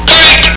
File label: correct